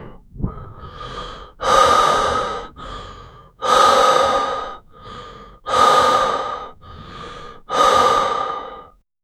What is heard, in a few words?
a male breath taking, breathing